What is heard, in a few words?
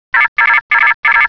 computer bleep, ambience; computer bleep2